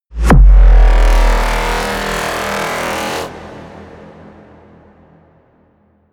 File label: blast, hits explosionen metall